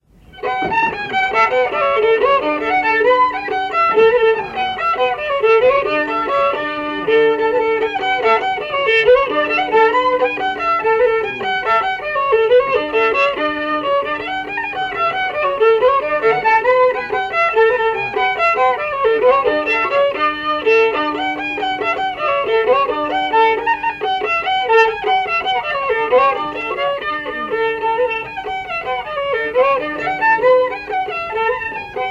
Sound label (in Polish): muzyka; muzyka ocalona; lowickie